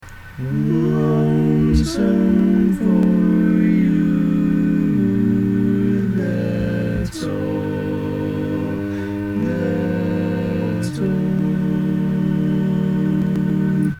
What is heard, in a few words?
barbershop tags, all parts